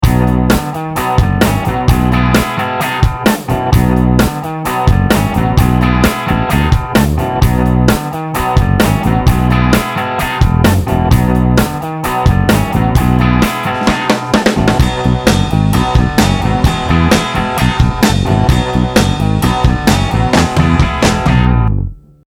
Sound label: ROCK